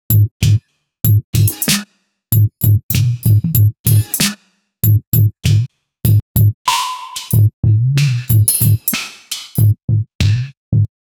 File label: cool and cinamaric sounds, beat